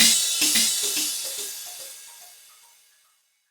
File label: Cymbal, PsyTrance